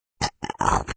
Anm Snrt, Single pig snort

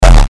Hit Hard Distorted, Hit Hard Distorted Bass; Blastwave

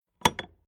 Industrial Large Electric Switch On Metallic

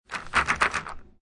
Billiard Pool Snooker Rack Up Balls In Frame

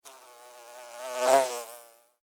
Fly, Take, Off, Buzz, Wings, Insect, Bug; Flies; Animals:Insects

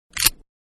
Van-Seat Belt Click-Interior; Cars; Vehicles

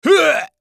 Human Throw Up, Throw Up Element Male Adult; Vomiting; Human Throw Up Element Male Middle Age; Blastwave; Humans

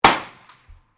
PISTOL 38 REVOLVER FIRING; Handguns, non military; Pistols (Sound Effects:Guns)

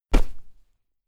Cloth Character Movement Foley Whoosh

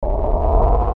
Ascend Multiple Panned Swells; Blastwave

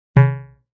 Dong; Dongs; multimedia

